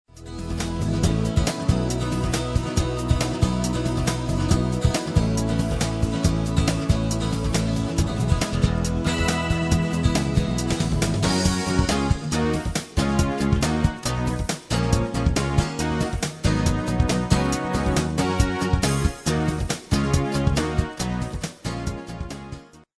pop rock

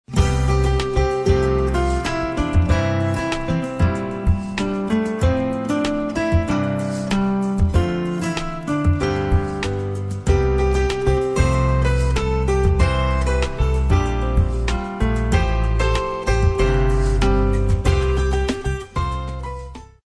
ez pop

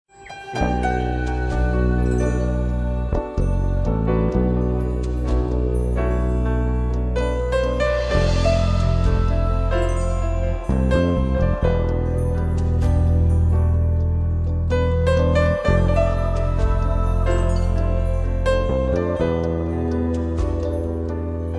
new age

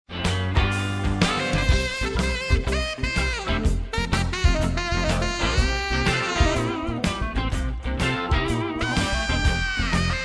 blues

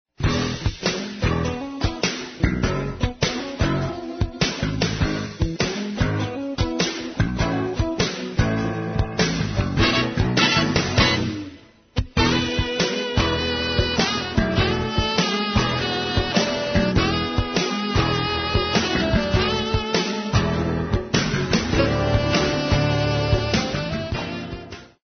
jazz pop

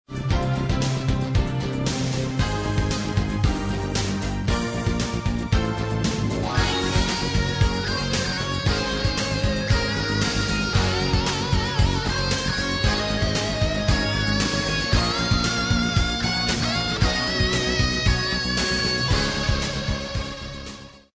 pop rock

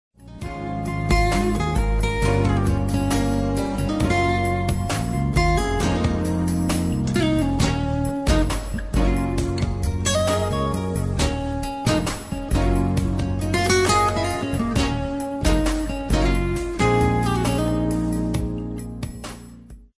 jazz smooth

